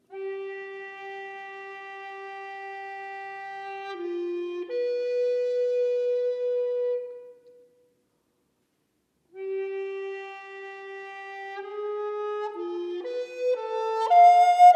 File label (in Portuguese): para flauta doce solo; Revoada para flauta doce solo (1994); Harry Crowl